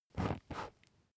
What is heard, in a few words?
match blow out